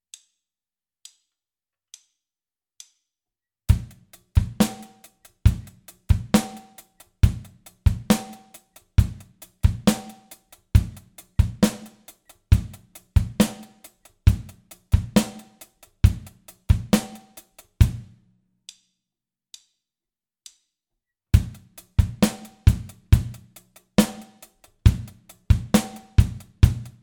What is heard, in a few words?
grooves